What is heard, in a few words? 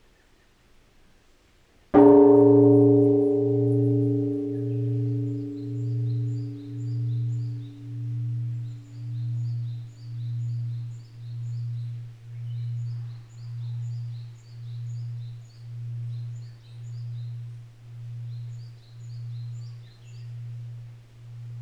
bell; Колокол